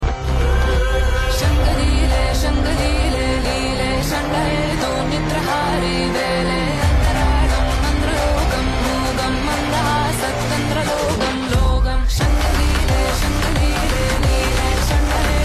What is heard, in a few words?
Bgm Ringtone